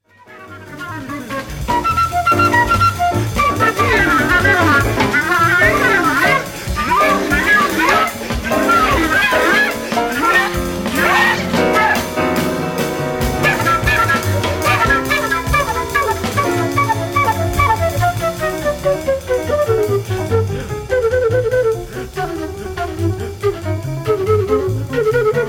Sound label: Flute